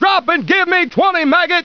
drill

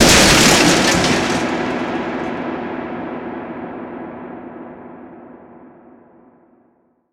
wood break; svencoop

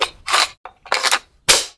mg deploy; weapons

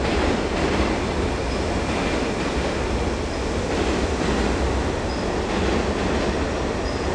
subway; cstrike; kif metro

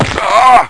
fall pain, player; firearms

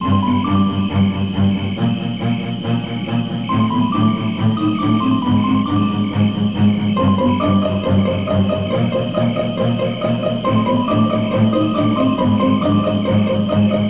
sneeky music; tfc; soldier4hire

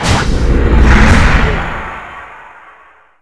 port suck in; svencoop; keenhalloween

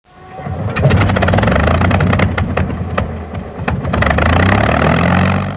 P51 START, ambience; dod

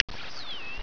desert eagle sight, weapons; svencoop

